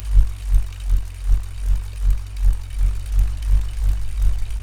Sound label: BULLROARER, Primitive Instruments